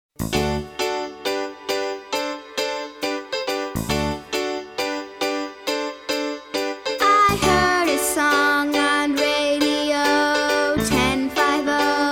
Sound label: Jingle; Short Attention Jingle Show